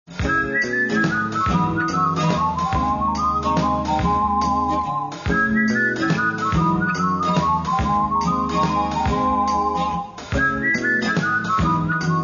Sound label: whistle